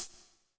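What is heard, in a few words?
hi-hat, drums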